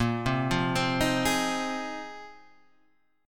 Bb 7th Suspended 2nd Suspended 4th Bb down Guitar Half Step Down